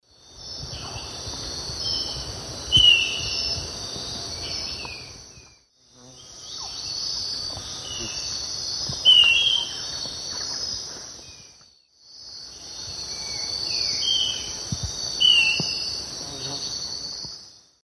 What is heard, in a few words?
Xiphorhynchus ocellatus, calls pair; S. America; Eastern Lowlands